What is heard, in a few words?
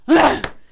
cudgel attack; megaglest